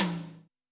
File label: TOM, Yamaha SY85 Drums